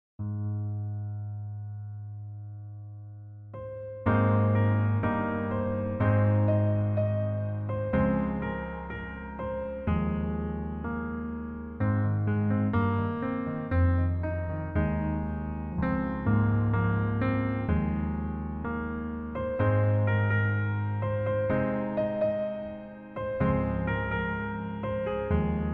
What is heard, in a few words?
Piano